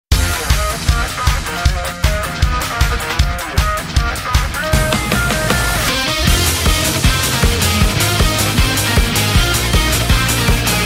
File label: Karaoke Version; strikers unofficial soundtrack